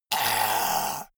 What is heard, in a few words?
monster gasp; kreaturen